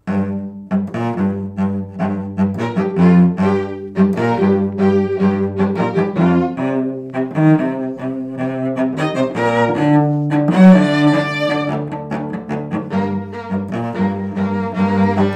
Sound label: String Ensemble